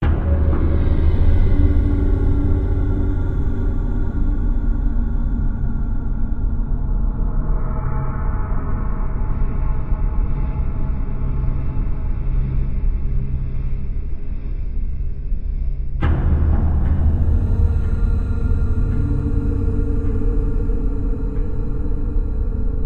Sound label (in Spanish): Atmosfera; Atmosferas